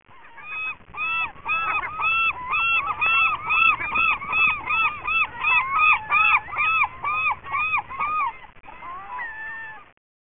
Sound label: SEAGULLS, Beach; CAVE